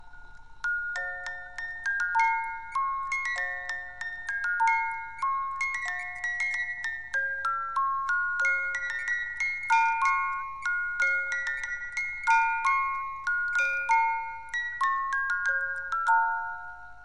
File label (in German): 4-Jahreszeiten (Spring), Musikwerk 18-Tunes